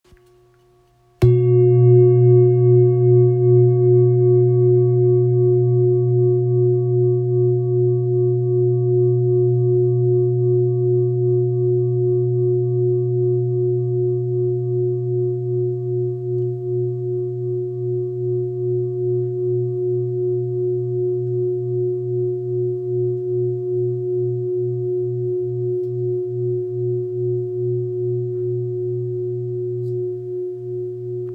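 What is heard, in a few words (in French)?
Bol grave fleur de vie do, bol chantant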